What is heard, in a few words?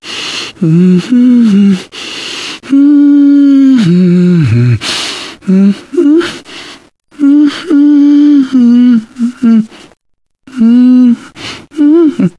nervous humming, survivor voice, manager